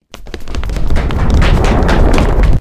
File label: Many footsteps; Zenonzard